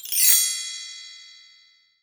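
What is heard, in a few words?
Bell Tree; Roland SPD-S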